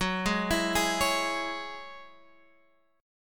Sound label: Gb 7th Suspended 2nd Gb Guitar Open G